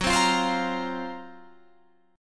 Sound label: F sharp Major 7th Flat 5th, Guitar, Drop D